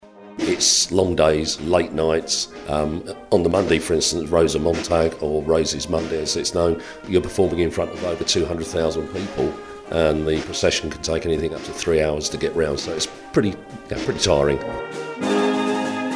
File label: Corps of Drums; Redhill